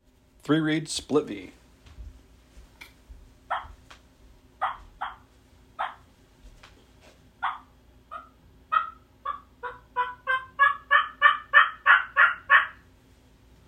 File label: 3 Reed Split V Mouth Call